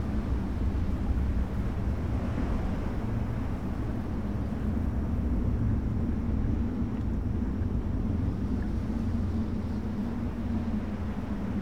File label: Home Ambience; OuterWildsModManager; OWML; AnonymousStrangerOW.TheStrangerTheyAre